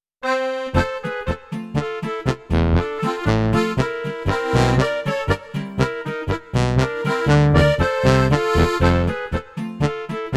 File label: Sound module baritone; SONATTER TM4